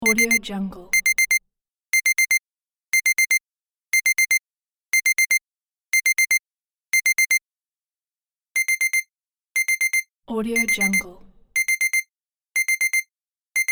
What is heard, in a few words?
alarm clock